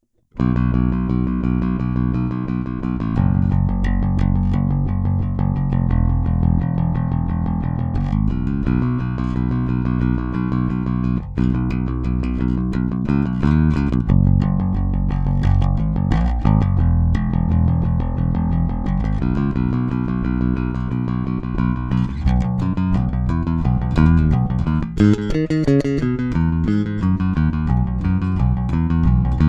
Punk Mix

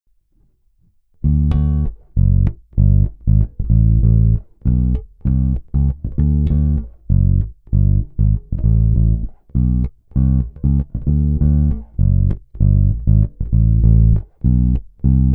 Shortscale Soul Mix, basses

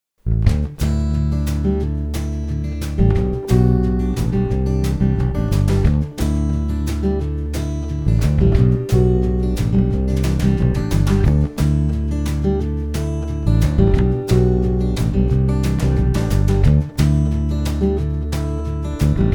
Shortscale Country Mix, basses

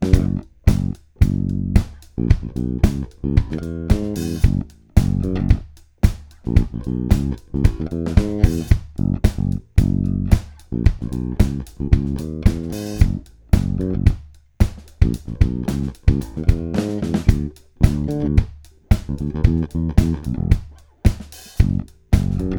Funk Mix, basses

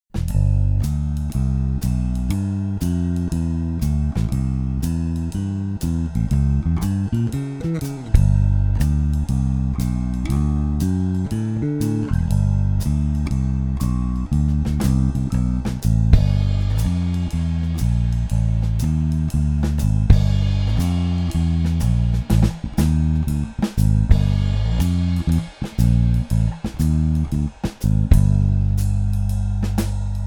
Shortscale J Jazz, basses

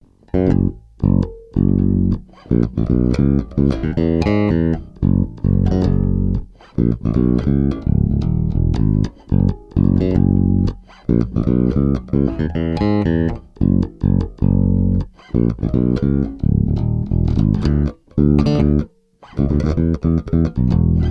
Progress 5 Funk Mix, basses

